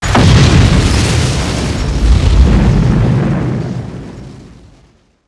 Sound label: big explosion